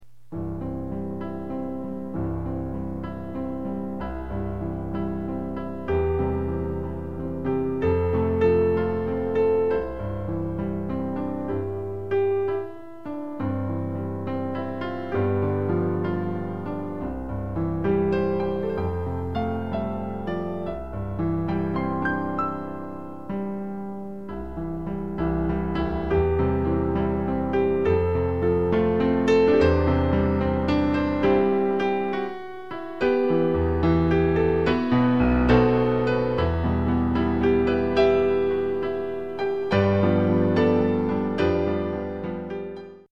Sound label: O Holy Night advanced piano solo